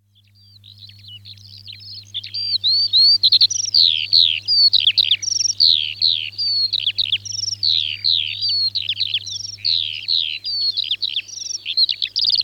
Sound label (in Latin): Alauda arvensis